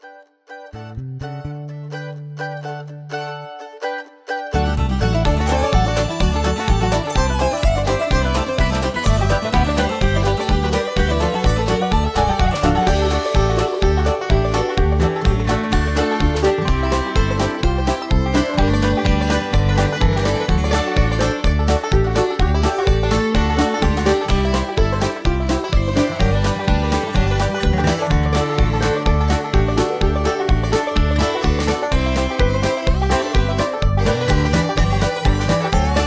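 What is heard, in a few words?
Instrumental with background vocals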